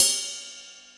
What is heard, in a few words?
kit, Yamaha Motif-ES8